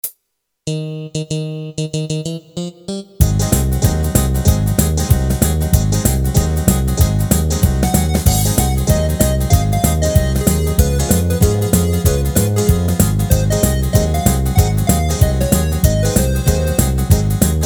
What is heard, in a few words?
pop music